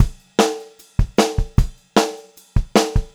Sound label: Pop-Rock Drumloops; USB Soundscan vol.37; AKAI; 90 s Sample CDs; 152 POP